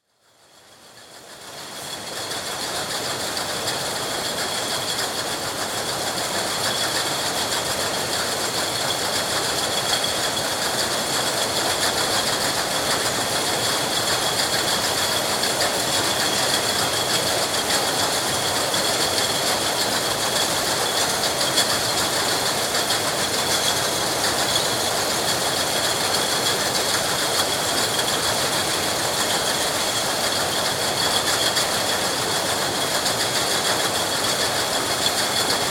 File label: Milk Bottling Plant, bottle filling, bottles filled & capped; Farm Sound Effects; BBC